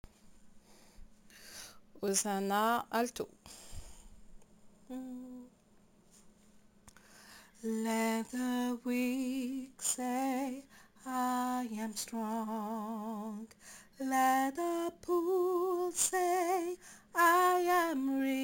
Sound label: Hosanna Alto